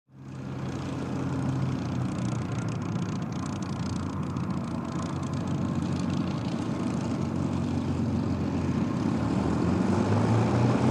Prop Plane Taxi Mosquito

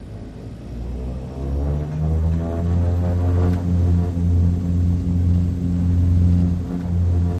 Air Express Interior Take Off, Short Rev and Loop

